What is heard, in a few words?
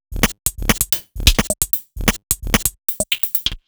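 Odd Glitch, 130 BPM, Percussion; Sonic Foundry (Sony Creative Software); Underground UK House & Electro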